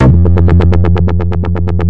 echo hardbass; BASS LOOPS

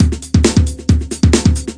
135 bpm ringing kick funk

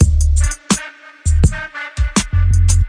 skin limits loop

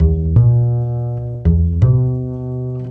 165 acbass climb 5ths, bass loops

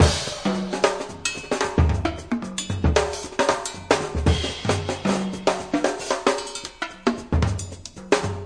114 bpm, funny bell breaks

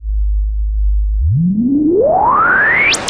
noise SH101, Noise Sweeps

